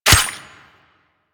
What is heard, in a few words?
Melee Dagger Impact Flesh